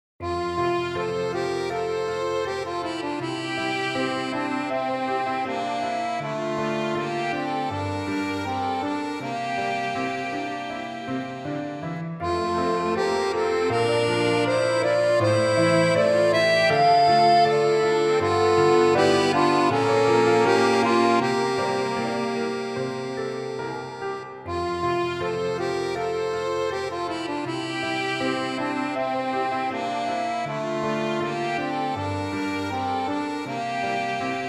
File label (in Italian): Trio violino; mini suite